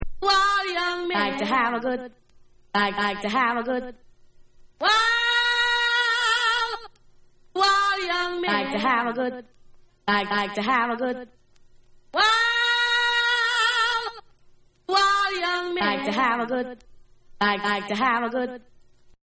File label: Acapella